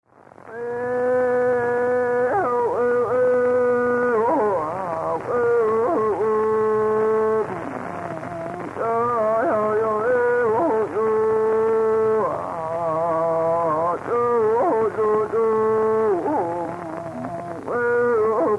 Canto; El Naranjo, Lonquimay, Chile; Música Mapuche

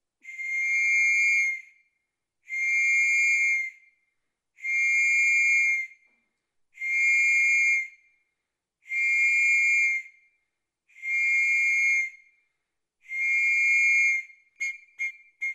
pito

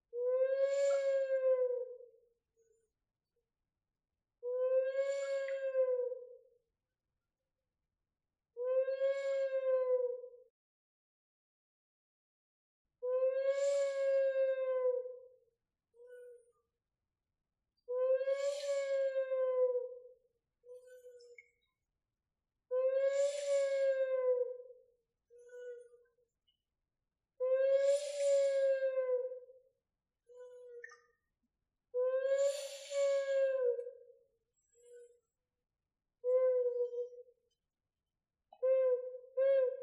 vaso silbato

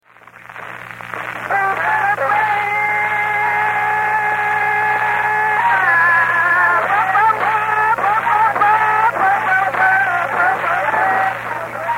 Canto de mujer con kultrún pifilka y trutruka; Música Mapuche

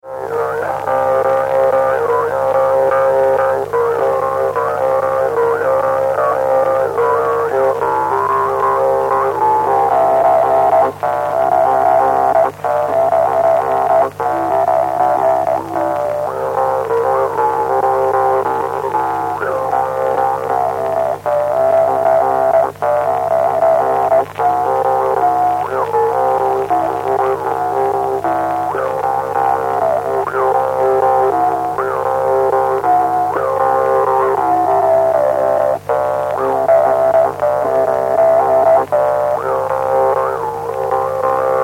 Toque de trompe; Música Mapuche

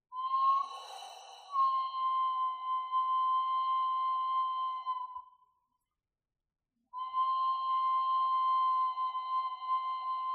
botella silbato

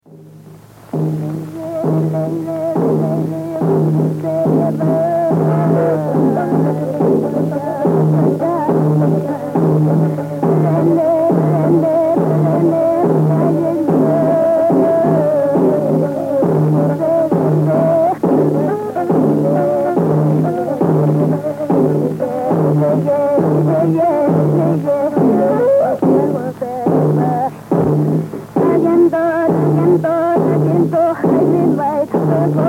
Canto de mujer con kultrún; Música Mapuche

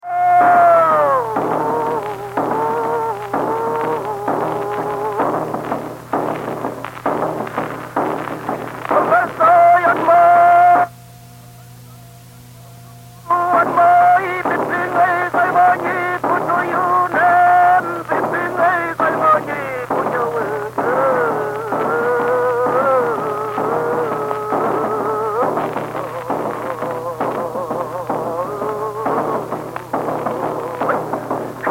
Canto de mujer con kultrún; Música Mapuche

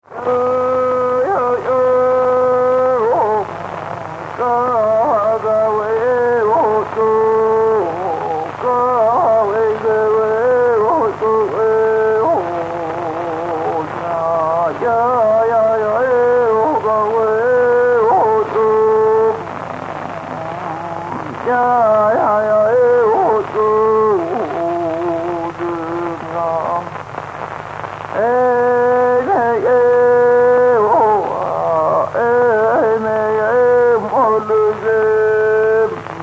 Canto de mujer para loncomeo; Música Mapuche

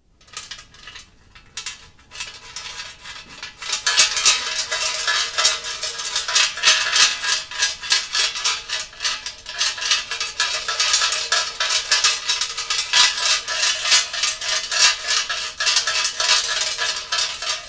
cuchillo ceremonial sonaja

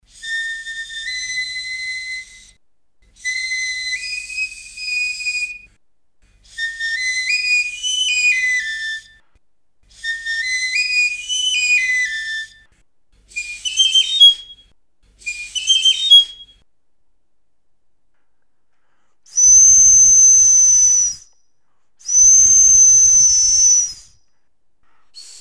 quena de hueso

